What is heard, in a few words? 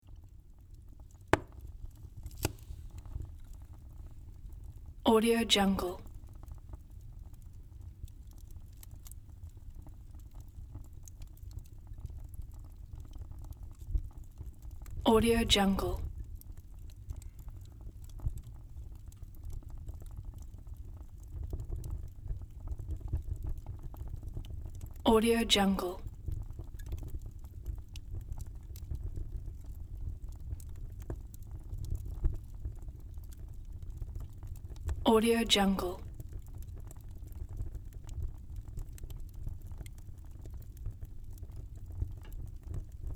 fireplace ambience